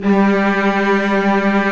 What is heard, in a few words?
Vcs2 f vb; STR Vcs 1-2 vb